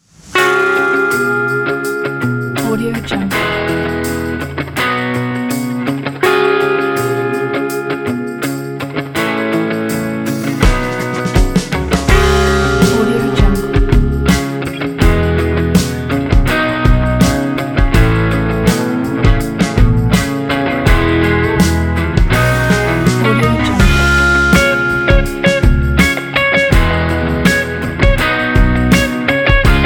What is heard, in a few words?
reggae rock